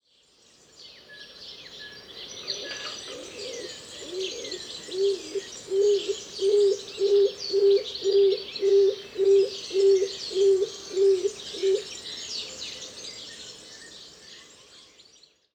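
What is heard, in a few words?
stock dove columba oenas; klintuh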